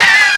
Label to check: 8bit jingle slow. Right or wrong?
Wrong